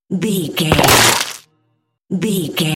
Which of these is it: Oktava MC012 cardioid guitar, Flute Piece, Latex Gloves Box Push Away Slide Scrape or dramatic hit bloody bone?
dramatic hit bloody bone